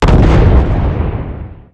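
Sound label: explosion medium generic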